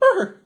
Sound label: brrrrrr, Voice